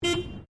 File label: Car Toyota Corolla Horn Short Exterior; 2009 Toyota Corolla hatchback; Vehicles; Cars